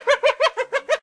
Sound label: Giggling call of a spotted hyena Crocuta crocuta